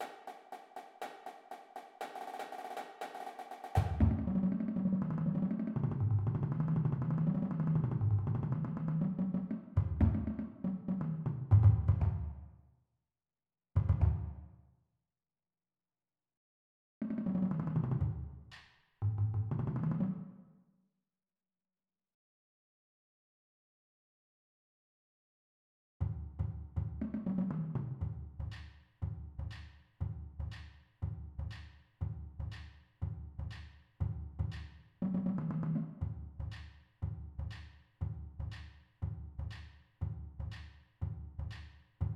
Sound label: bass; cadence